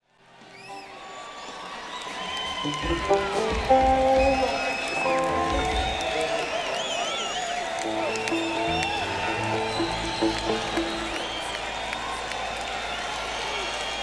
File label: Crowd Tuning; senn me80